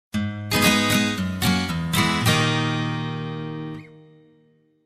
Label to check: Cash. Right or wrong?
Wrong